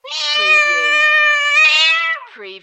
Cat Meow Emphatic

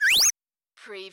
CARTOON SWEEP

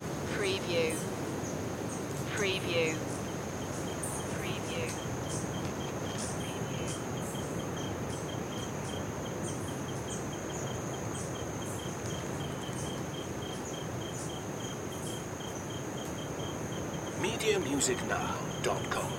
rainforest and ocean

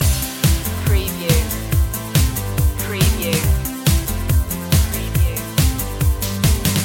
singing robots loop

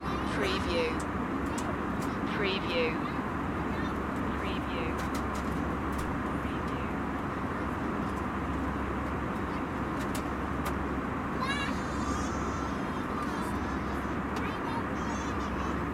airplane ambience

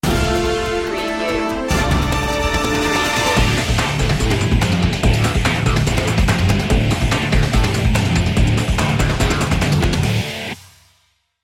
action cues breaking sports

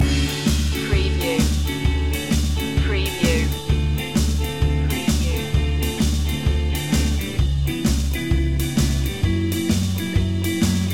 swing loop

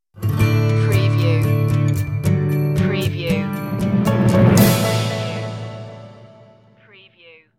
good morning guitar stinger

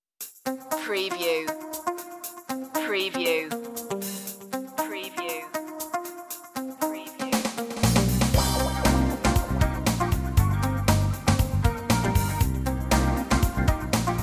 city blues

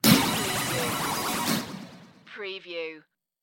Hit Digital Readout

